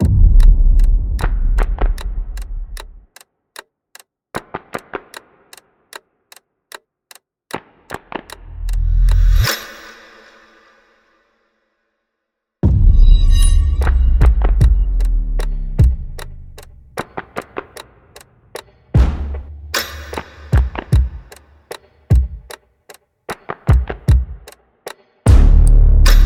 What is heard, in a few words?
heist ticker instrumental